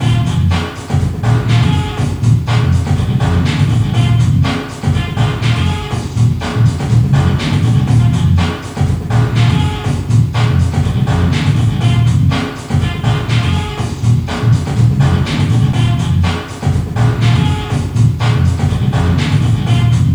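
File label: background music store; yakuza ryu ga gotoku kiwami 2 pc gamerip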